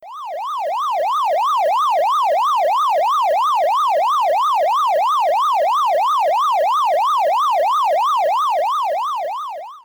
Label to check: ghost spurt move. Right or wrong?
Right